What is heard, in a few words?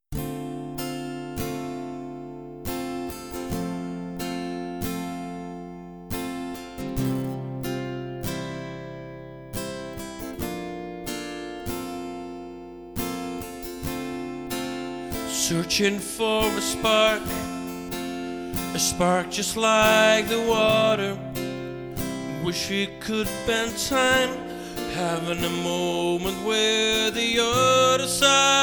Acoustic version